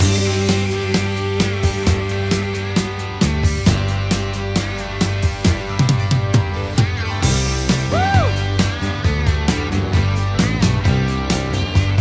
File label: loop key G